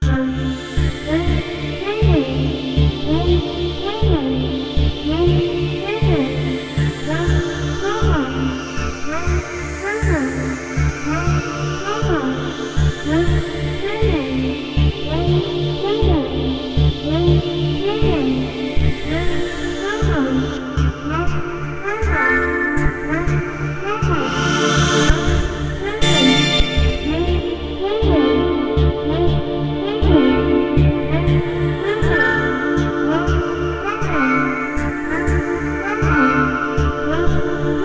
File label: Multi Part Pad; Waldorf Kyra